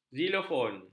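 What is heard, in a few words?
zilofon, xylophone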